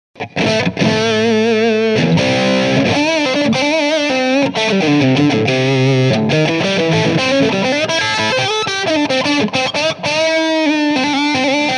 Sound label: strat